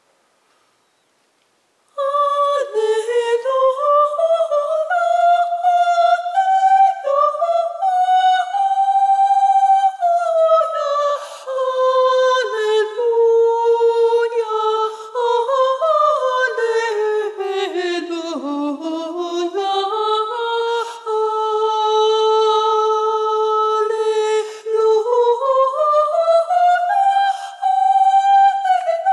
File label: Dic nobis Maria Soprano